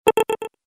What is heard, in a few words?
samsung galaxy a15 5g sms